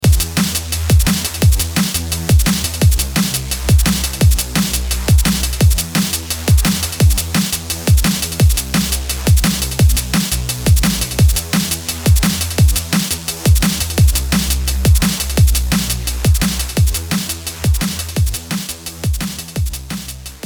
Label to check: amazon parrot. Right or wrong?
Wrong